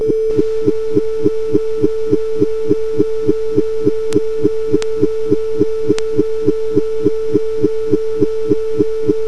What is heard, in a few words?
space sounds